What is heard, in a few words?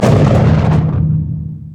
sad mummy despair; LoL; amumu